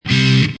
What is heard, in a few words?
Rocking electric guitar chord, Distorted Guitar Chord in Cm; Part of Guitars (Sound Effects:Musical)